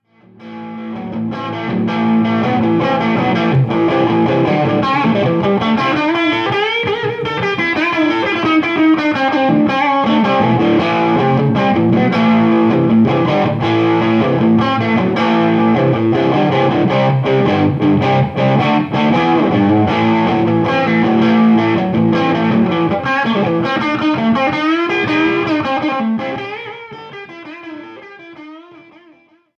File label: stratocaster clean low gain fat